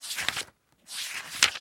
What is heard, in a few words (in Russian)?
kniga dve stranicy